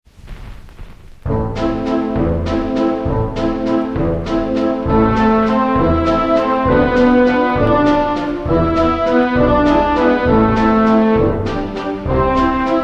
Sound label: waltz